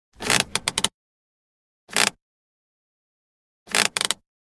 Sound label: Car handbrake pulls; Cars